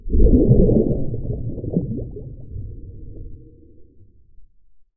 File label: Underwater Explosion